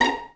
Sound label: VI2PIZZ#A4, VI PIZ; Best Service; Peter Siedlaczek's Advanced Orchestra